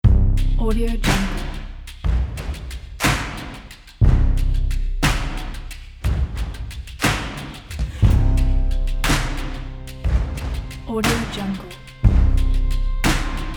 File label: punchy drums; hyperprod